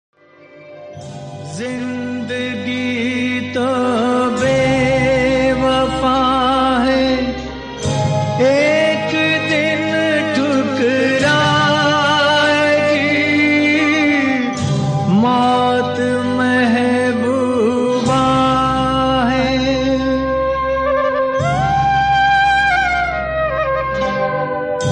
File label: Hindi Song